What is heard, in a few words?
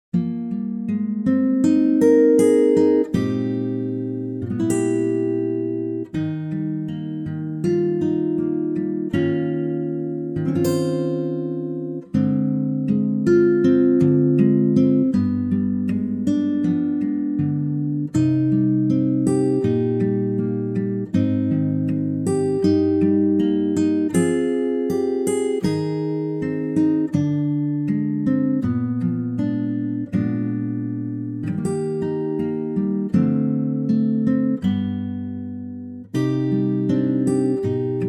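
Eb acoustic guitar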